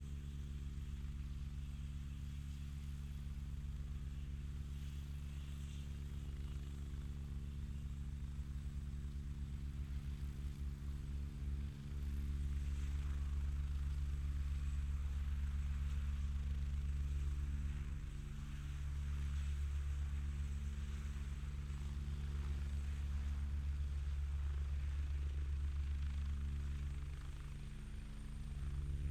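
agusta a109 exterior idle take off away behind, Agusta A109; ORTF; MKH8040; Pole Position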